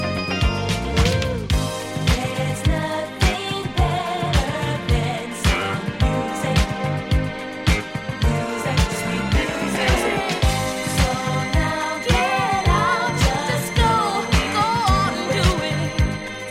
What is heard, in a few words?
12 Inch Dance 80s Groove